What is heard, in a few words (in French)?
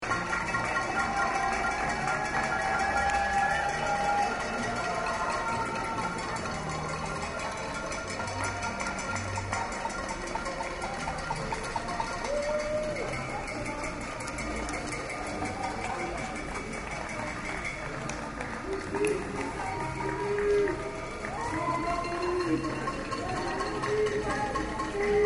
Concert